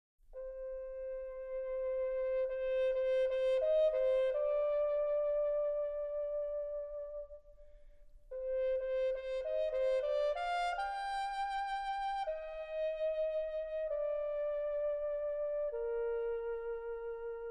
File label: Concerto for Trumpet and Chamber Orchestra, Allegro